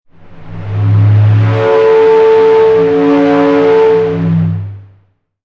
sound design air pipe pressure, Pressurized Air Release; Sound Design - Pressurized Air Or Steam Release From Pipe; Hisses; Objects; McKinney Sound